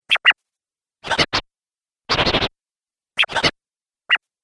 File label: Vinyl Scratching Sounds; Miscellaneous Musical Sound Effects